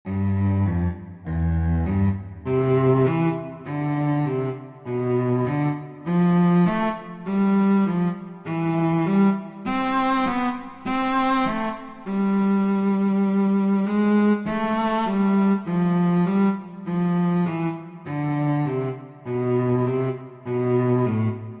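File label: Minuet, cello; Very Easy Collection; Mozart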